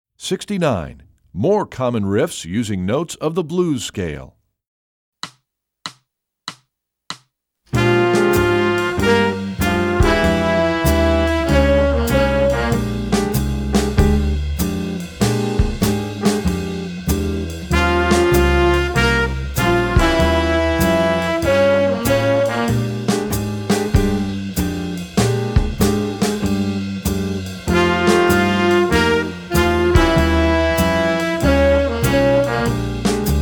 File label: more common riffs using notes